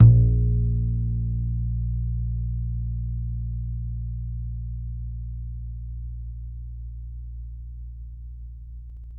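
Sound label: acoustic bass soft A#1 right; Masterbits CLIMAX 7 - Bass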